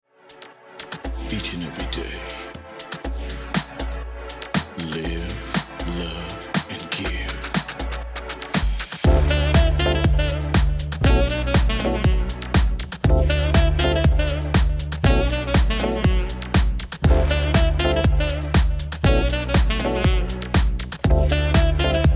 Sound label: Electro Jazz